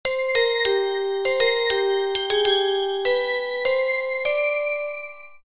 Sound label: Tubular, Deurbellen